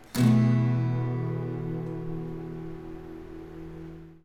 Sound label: Esus4, guitar